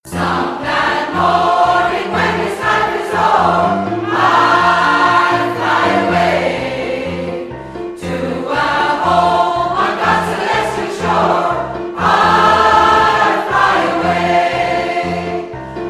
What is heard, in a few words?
gospel choir